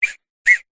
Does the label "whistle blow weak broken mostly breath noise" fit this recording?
Yes